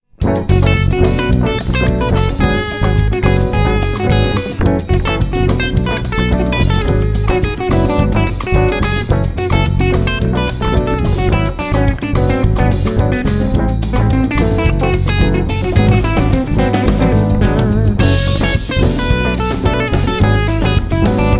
8 tone spanish, guitar

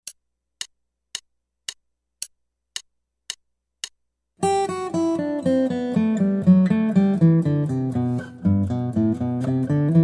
Guitar

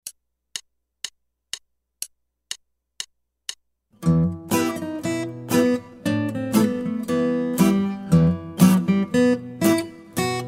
Guitar

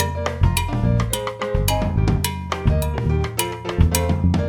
songo

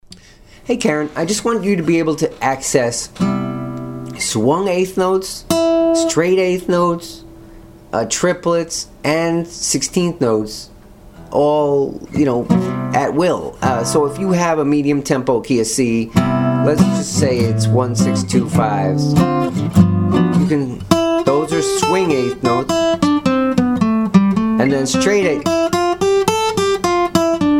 varying up between triplet and straight feel

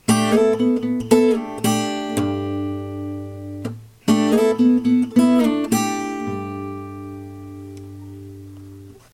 e shape major embellishment lick

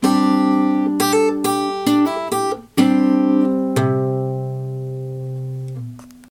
a shape major embellishment lick c, guitar

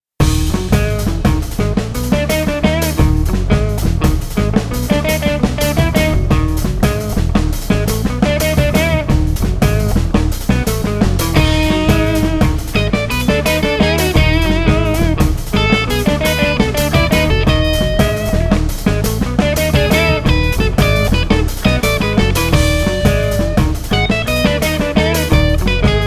locrian natural 7, guitar

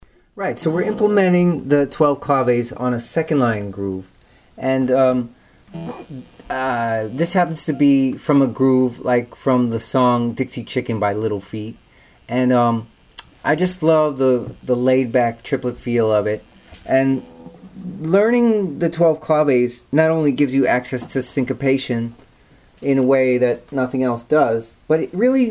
12 claves on second line groove

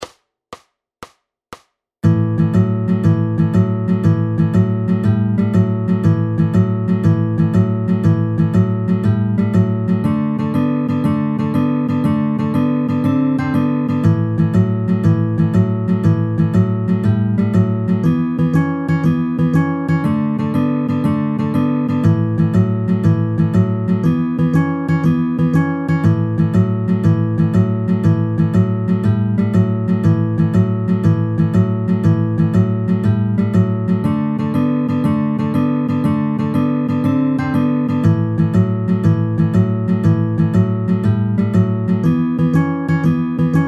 12 bar blues in A 6s and 7s 120 bpm

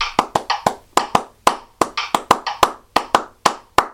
reversed clapped; 12 claves

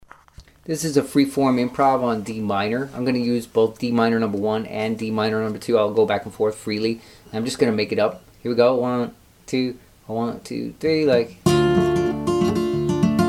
open string chord hybrids improv on Dm

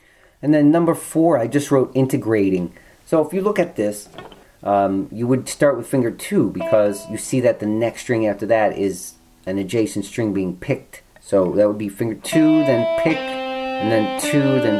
hybrid picking exercise, guitar

